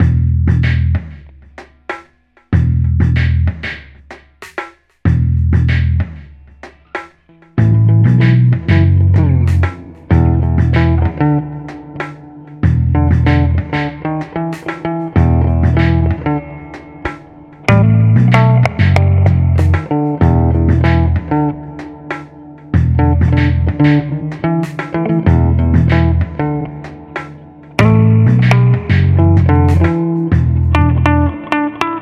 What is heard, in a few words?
morning funk